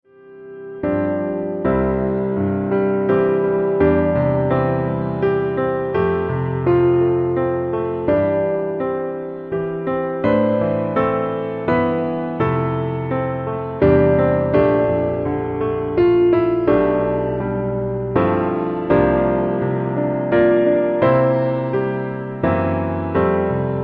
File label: Amazing Grace Pno Low